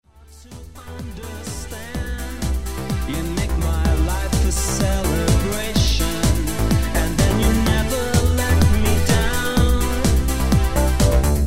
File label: electronic